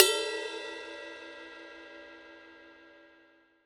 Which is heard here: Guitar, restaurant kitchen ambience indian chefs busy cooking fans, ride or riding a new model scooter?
ride